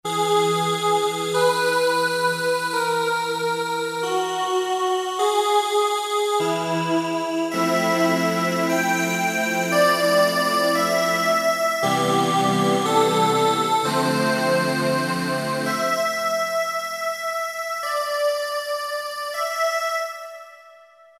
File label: Whispy Vox, Roland PN-D50; Roland PN-D50-03